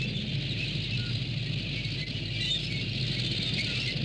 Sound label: EXT TANK; PC Gamer IT CD 47; USAF; USAFDEMO